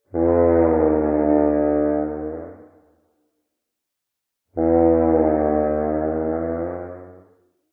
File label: dinosaur long groan call; animals